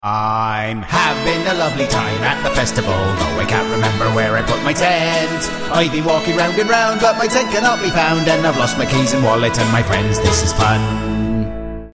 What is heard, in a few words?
festival jingle; Adam and Joe